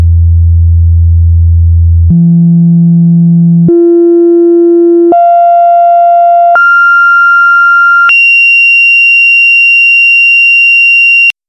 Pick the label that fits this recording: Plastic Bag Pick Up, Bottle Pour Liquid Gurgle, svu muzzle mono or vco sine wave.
vco sine wave